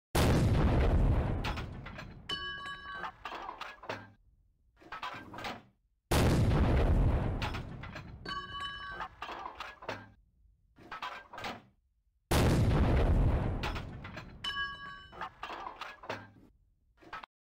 artillery

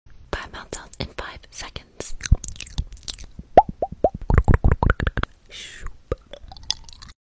ASMR Mouth

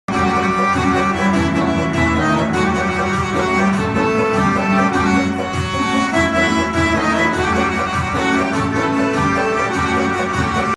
Garmon

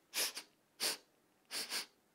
sniff

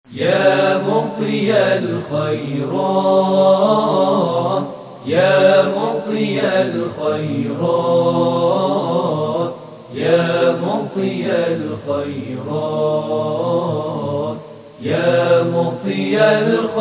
prayer ringtone

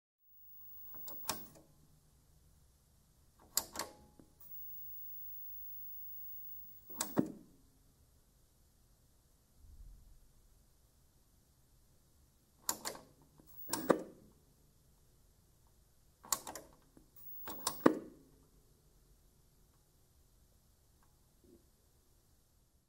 turn on tv

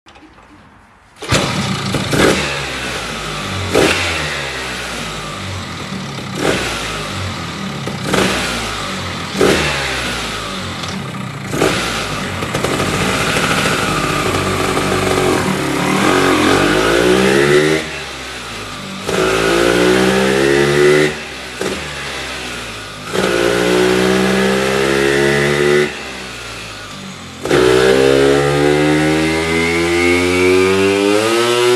Vespa

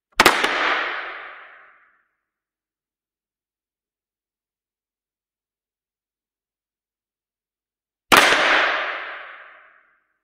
Wooden pallet

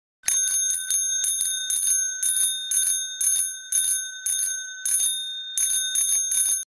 Cycle Bell

